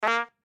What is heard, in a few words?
trumpet hit